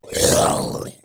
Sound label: zombie grunt; zombies